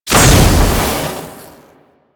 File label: Alchemy Vent Turn Off; Warframe; Entrati